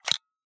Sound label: old camera close shutter